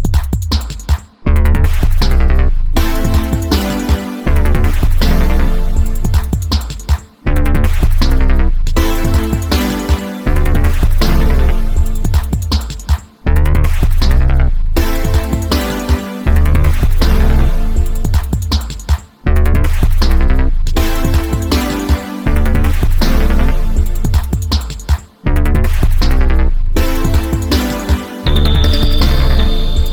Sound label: instrumental running workout 160